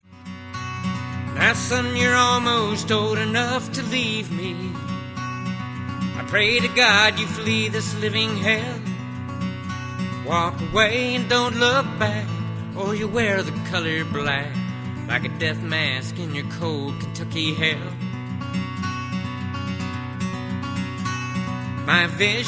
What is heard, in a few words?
Acoustic Track